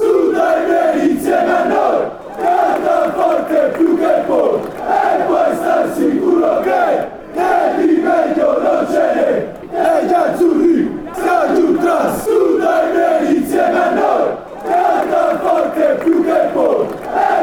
with us, fan chants

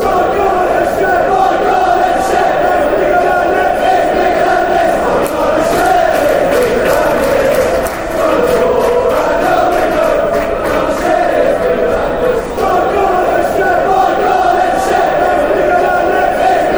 my garden shed, chants; cheltenham

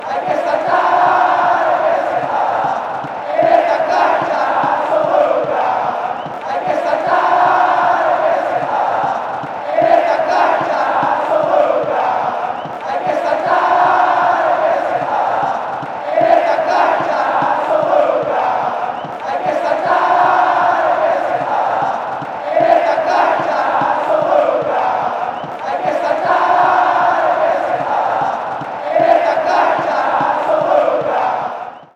hay que saltar fanchants

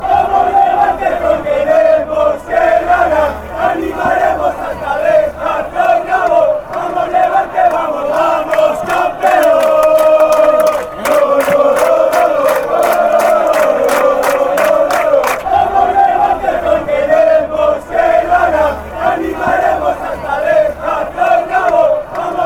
come on champ, chants

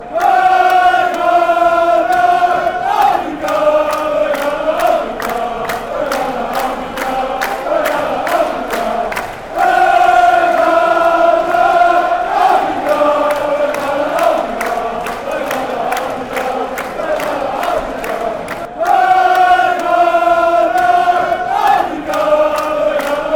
Holland chant

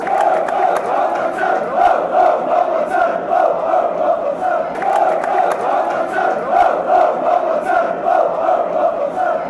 chant, fanchants; fc thun

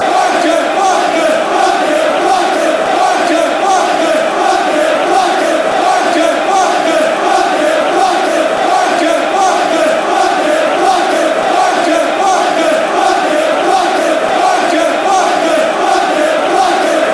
wnker, fan chants; trfc

